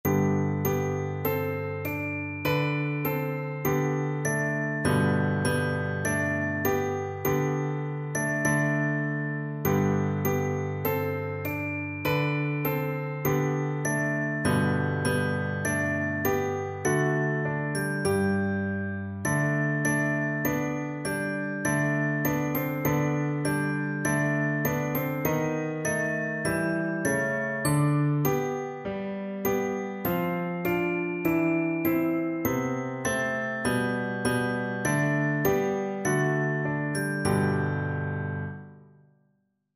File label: ode to joy, percussion; beethoven